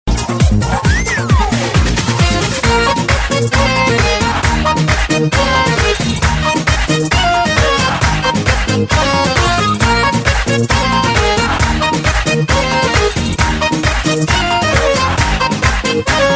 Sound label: Beat